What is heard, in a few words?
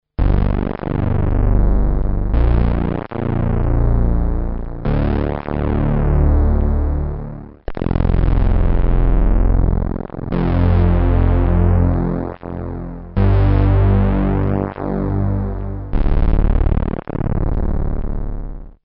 pwm; droid3